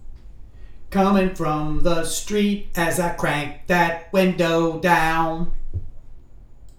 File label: vocals